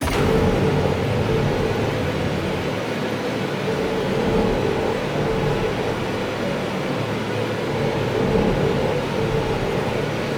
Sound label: Attack Horror Soundscape, tension horror; dark ambient music